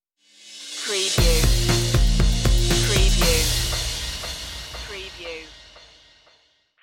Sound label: northern lights stinger